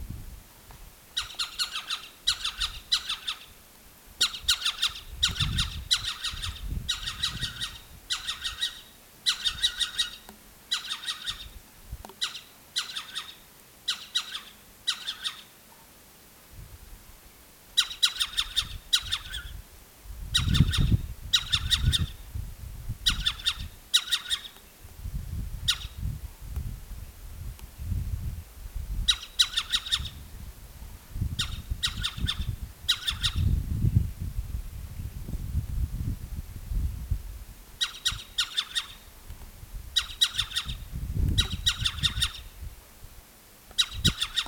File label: alarm